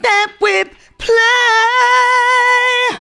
FEM HO, Female Hooks; Sound & Vision - Gigapack II CD 1

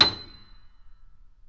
Mf B 6, Grand Piano off mic; AKAI S6000 CD-ROM - Volume 2

